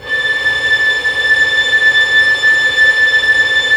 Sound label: STR, STRINGS; Roland - LA Composer vol. 1